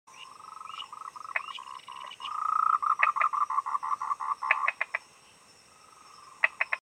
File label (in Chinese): 日本、莫氏及布氏樹蛙